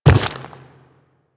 Quadruped Medium Land Default, footsteps; Warframe; Infested

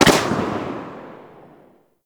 Shot Tail; Warframe; Afuris

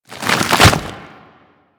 Golem Gun Wings Open; Warframe; Foley

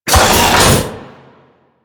Storage Locker Open; Grineer

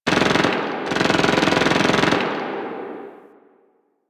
Distant Gunfire

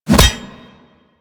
Wolf Axe Catch A; Warframe; Grineer

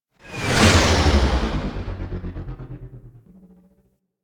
Moon Intro Rail Jack Fly By; The New War

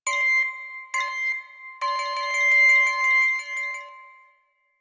Machine Beeps; Warframe; Quests; Entrati Labs; Cavia Rank Up Scenes

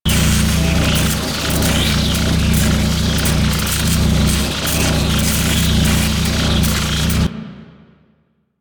Energy Beam Loop; Ambience; Props; Warframe; Corpus Railjack

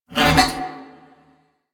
Ram Grunt; Animals; DE; Warframe; Quests; Duviri

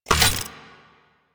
Mech Aim Start; Nechro Mech; Vehicles

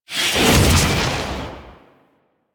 Purgatory Ghost Possess; Warframe; Abilities

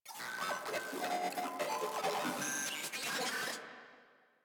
Moa Vocal Idle; Enemies; Warframe

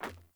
Sandals Run Metal, Footsteps; Characters; Player

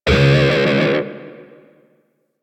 Grineer Shawzin Flourish Oct One C Sharp; Music; DE; Warframe

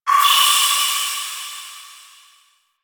Distant Drip; Ambience

